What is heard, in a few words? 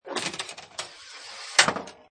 Pinball Sound Ball Rolls On Rail And Falls Off End; Video Game Sound Effects; Lynne Music